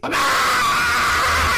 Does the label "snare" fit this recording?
No